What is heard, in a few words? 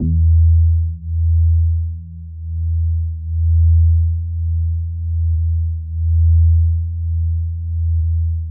Synth Basses E2; Music Store Sound Service; Einstein